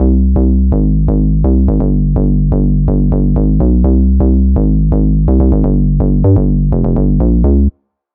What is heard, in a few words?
Cm 125bpm; Pumpit